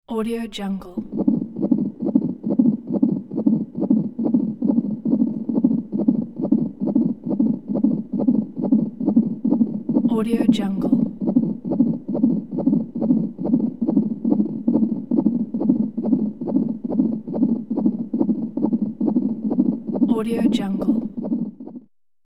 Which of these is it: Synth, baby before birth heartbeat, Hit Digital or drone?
baby before birth heartbeat